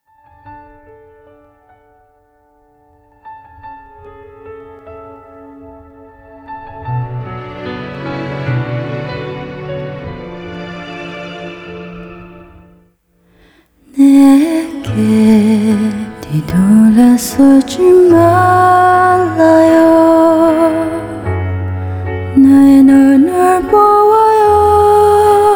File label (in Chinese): 韩语歌